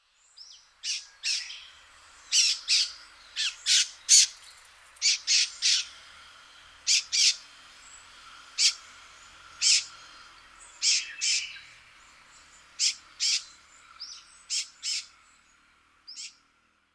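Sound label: Brown-eared Bulbul chick; hiyodori hina; hyogo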